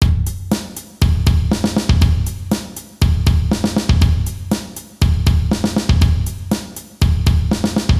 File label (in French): Rythme